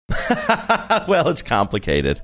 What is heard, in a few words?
laughing saying well it's complicated